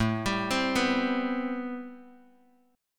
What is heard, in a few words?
A-Minor 9th A Bass Standard; arpeggiated; JGuitar